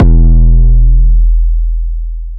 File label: 808 E; Cymatics